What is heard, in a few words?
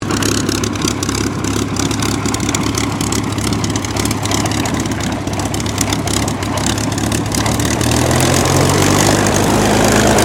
AIRCRAFT SUPERMARINE SPITFIRE MK-XVI TAXI SHUTDOWN; Airplanes; Vehicles; Airplane Sound Effects